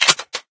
rpg eject; dn3d r

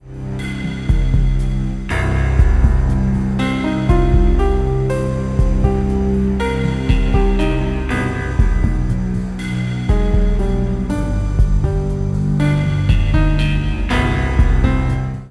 music; payne

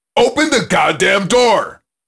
open door

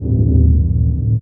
hum; dn3d; eng

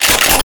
magazine

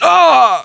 pain; cscz npc

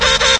peng hunt, penguin

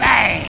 bang

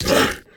zombie attack

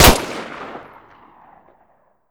gun alternate

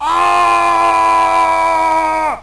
battlecry

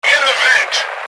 vent

